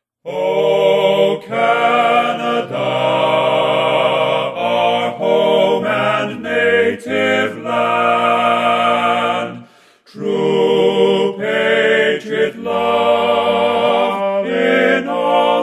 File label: o canada a cappella canadian anthem ttbb